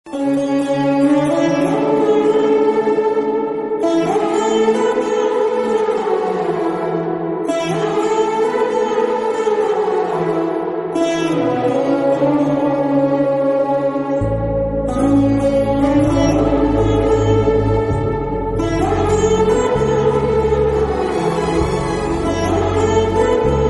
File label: instrumental